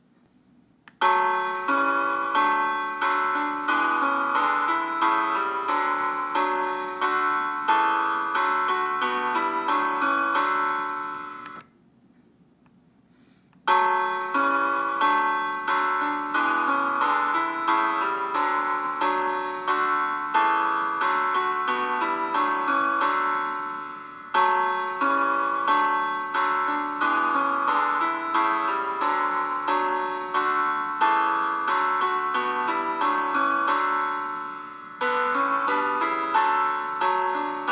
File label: Once in Davids Royal City piano only